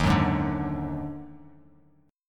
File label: Eb Minor Major 7th Double Flat 5th Eb Bass Standard, chord; JGuitar